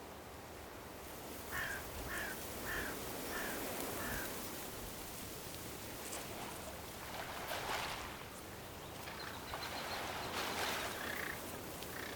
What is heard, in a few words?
outdoor seaside day